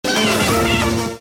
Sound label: wide open